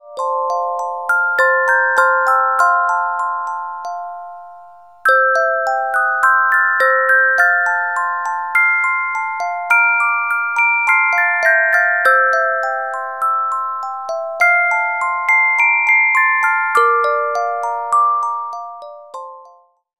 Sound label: Carillon Music Box Melodies